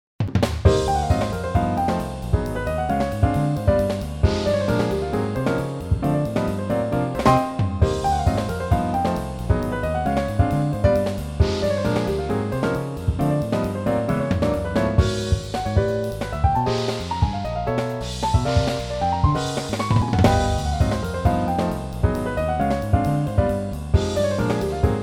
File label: Bebop